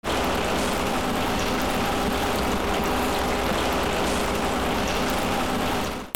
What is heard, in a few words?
INDUSTRY